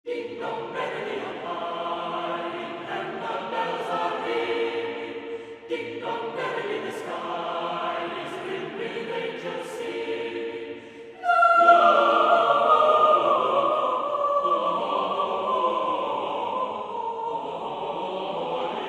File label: ding dong merrily on high; Dino D-Day